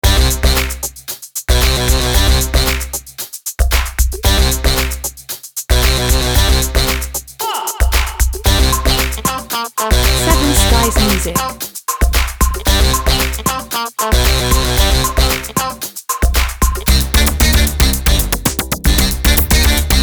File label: Yellow Sax